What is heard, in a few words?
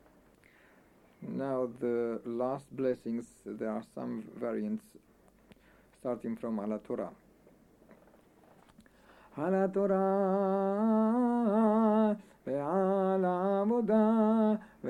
Blessings after Haftarah; Rosh Hashanah Shaharit